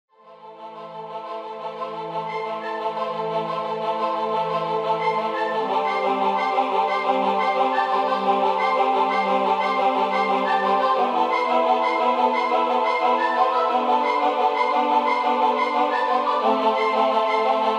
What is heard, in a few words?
action epic ringtone